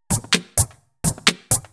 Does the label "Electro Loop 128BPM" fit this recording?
Yes